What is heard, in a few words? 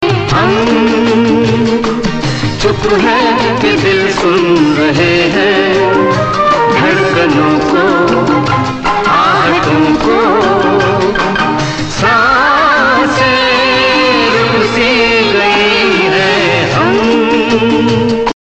Romantic song